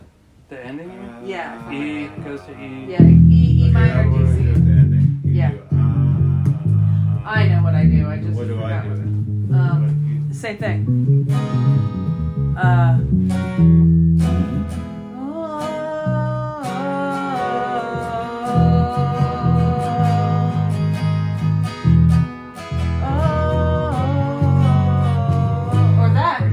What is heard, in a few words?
TV outro vocal parts